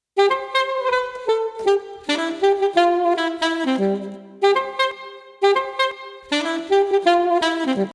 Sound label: Lead